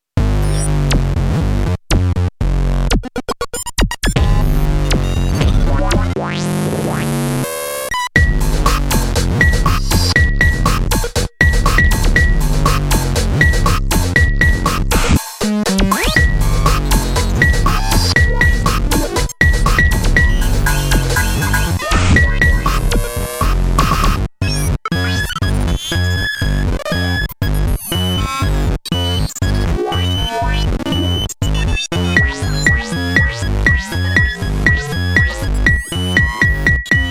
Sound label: Roland SH32 spank